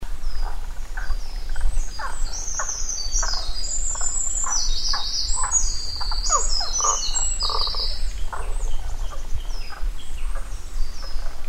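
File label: Tago's frog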